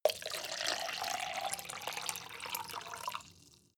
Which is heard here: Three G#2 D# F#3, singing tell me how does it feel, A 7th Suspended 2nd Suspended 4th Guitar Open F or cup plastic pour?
cup plastic pour